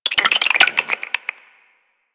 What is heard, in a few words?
Infested Minion Attack, Vocals; Warframe